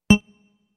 Cursor Move

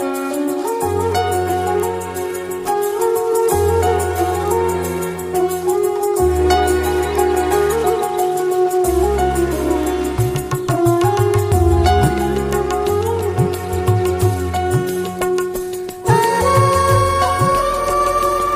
Kurukku Flute

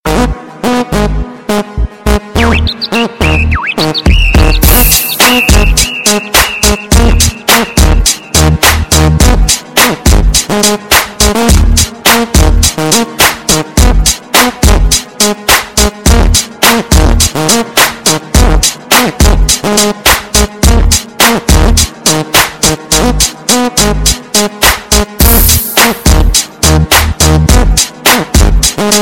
basss; ringtones

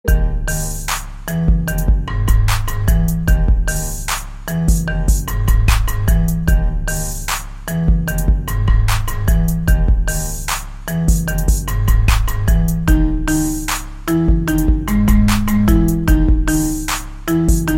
Marimba

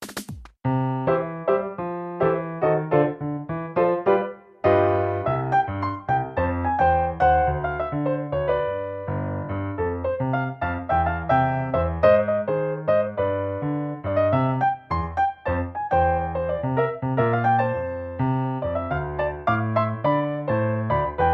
Run Piano

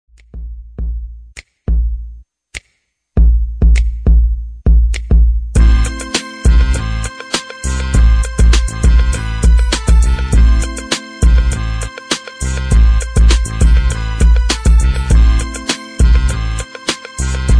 instrumental

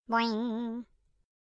bingo toiiiinnnngggg; ringtones; sms

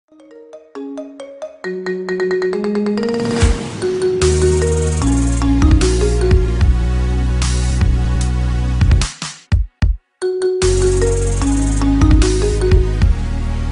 Marimba

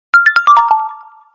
android tone; ringtones

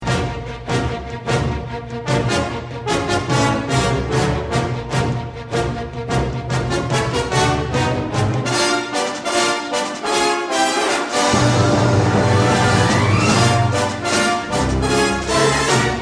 theme; tie fighter

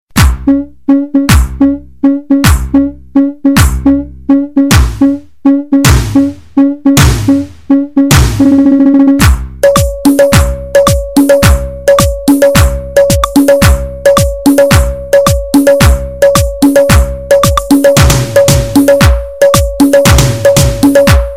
dance tone

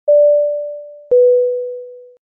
airplane tone two

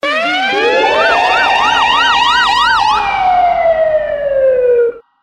police sound; ringtones

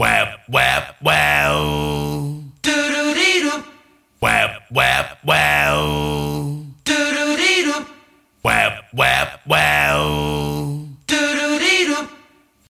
Wah Wah Wahum

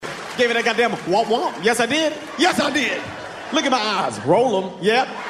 WOMP WOMP

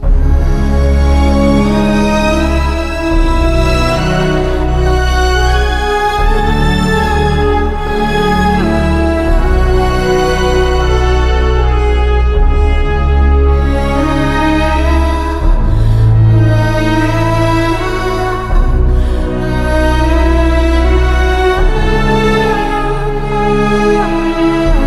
Fantasy Sound

